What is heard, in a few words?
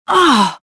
pain